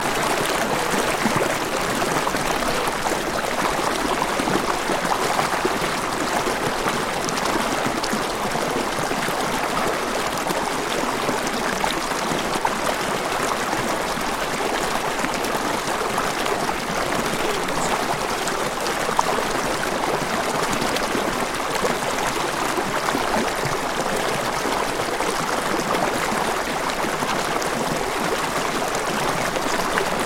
River Or Light Water Fall Loop

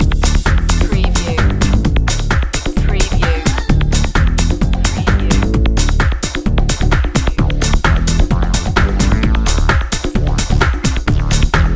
power beat loop

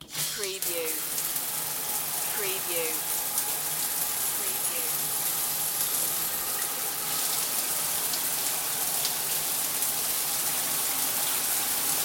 Shower On Run Off

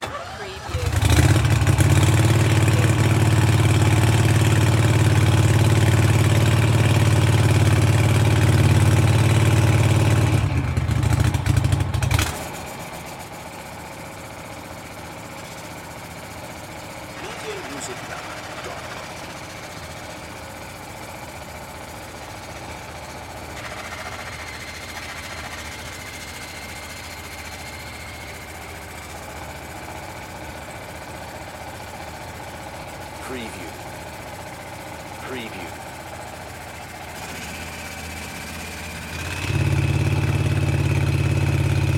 Fire Pump Operate

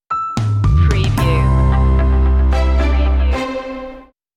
happy go lucky stinger

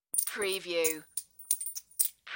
Coins Hand

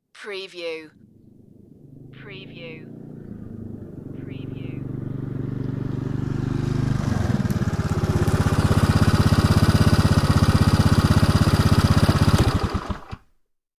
motorbike approaching

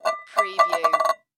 Tin Can Empty Set Down; sound effects bible

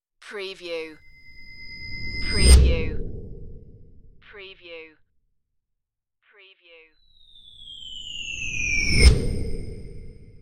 bright whooshes

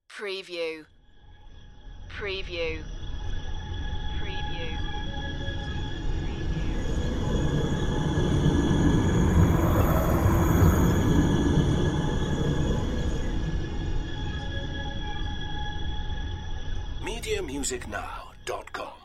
bizarre digital swooshes

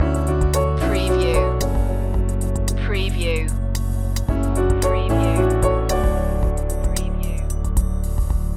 mass divide loop

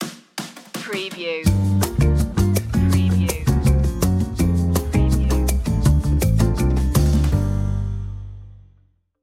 breezy night lounge stinger

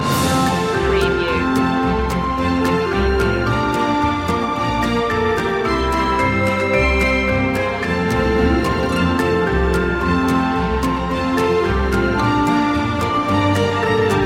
orchestral; jingle bells; media music now

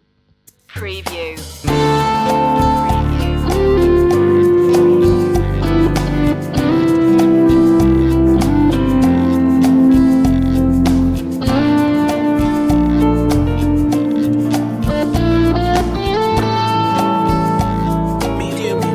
romantic guitar

